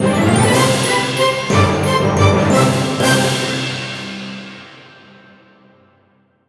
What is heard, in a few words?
Battle End SE; slash of the dragoon android game music